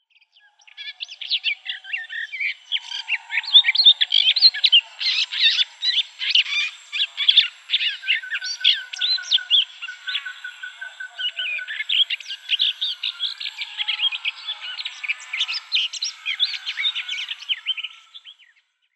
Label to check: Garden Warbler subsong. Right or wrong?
Right